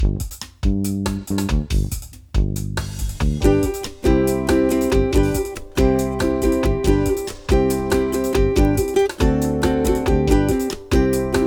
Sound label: Bright Side Of Uke B (no melody)